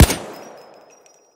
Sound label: shoot, weapons; tf2classic